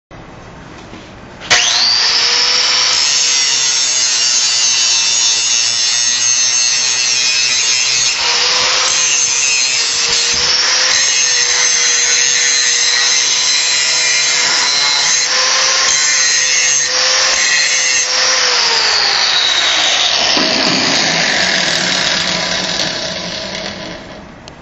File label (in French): Meuleuse d angle